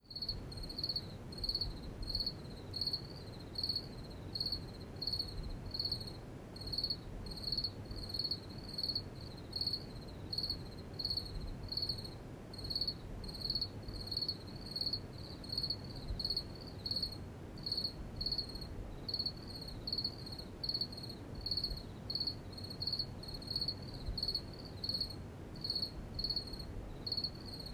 Night Crickets

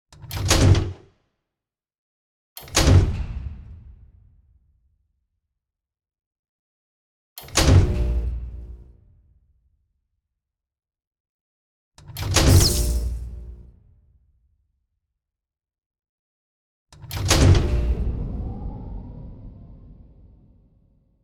Heavy Switch Including Additions

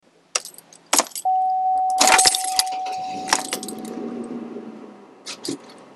Car Keys And Start

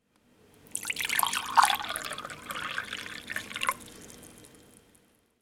Water In Glass

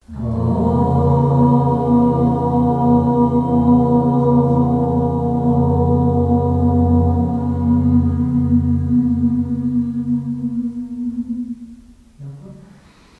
Group Chanting Om Aum

